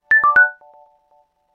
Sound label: Fold open; Nokia; 7390